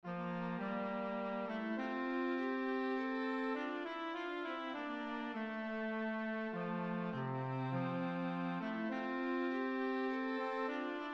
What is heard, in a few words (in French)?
Valse douce écossaise